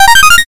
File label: oneup